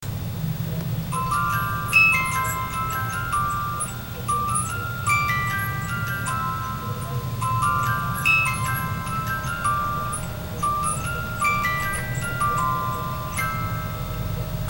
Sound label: 18 Note